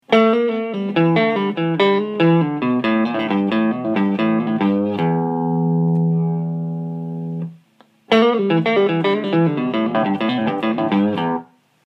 fast blues lick

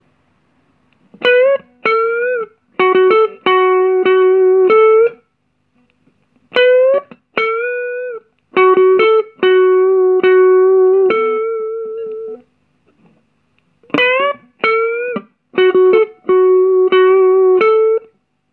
blues guitar lick step1

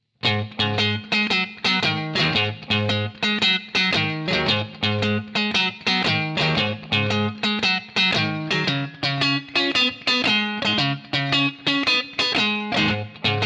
shuffle riff 12 bar, blues guitar

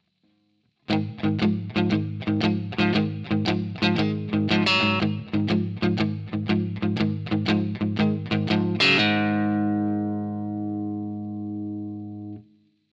shuffle palm mute, blues guitar

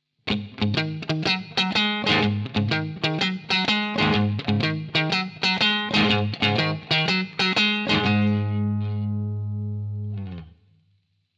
shuffle riff

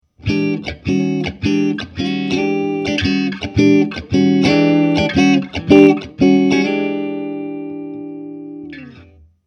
Expressive Blues Guitar

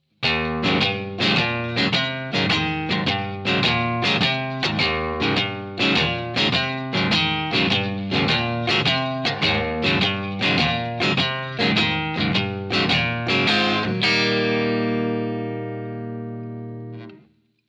shuffle texas variation, blues guitar

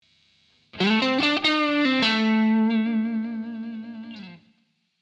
string bends

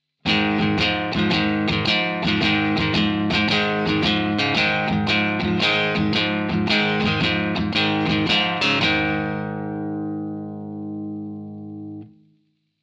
shuffle legato, blues guitar

